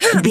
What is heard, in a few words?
voice female attack